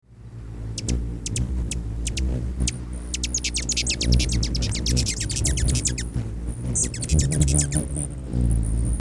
Hummingbirds Sound

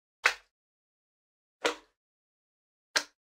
Slimes Minecraft; اسلایم در ماینکرافت

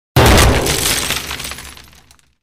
Hand grenade; ضامن دار; نارنجک دستی

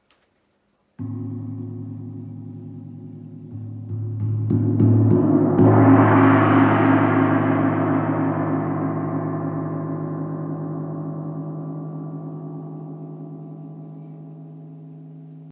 Tam Tam

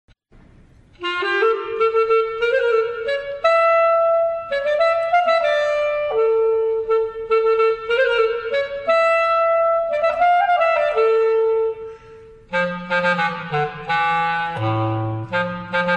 Basset horn; کرباست

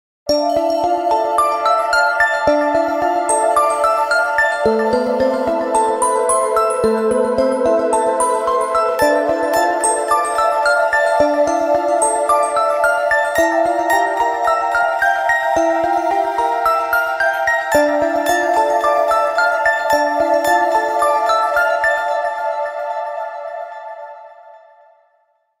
Fantasy Ringtone

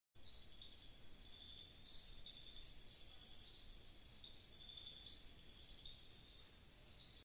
forest at night

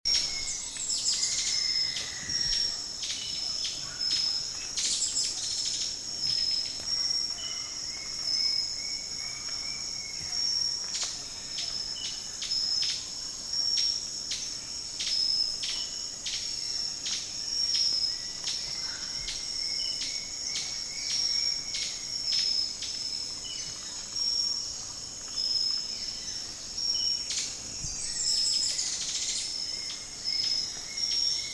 Fiery-topaz, پرنده زبرجدی آتشین; صدای پرنده زبرجدی آتشین

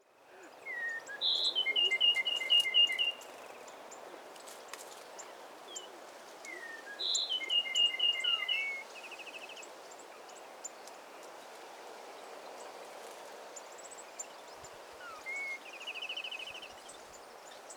Peruvian wren; پرنده الیکایی پرو

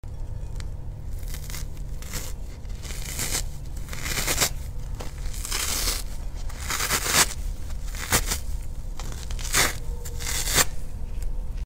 sponge; اسفنج خیس

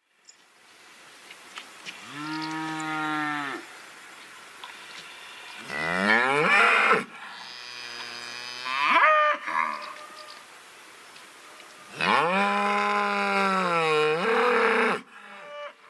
flock

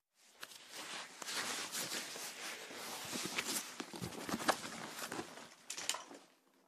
backpack

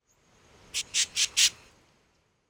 Northern Oriole; پرنده اوریول شمالی

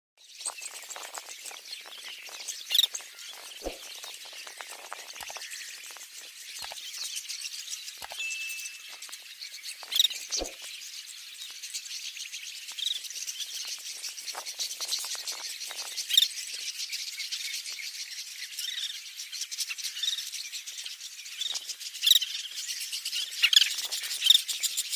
Collared pratincole

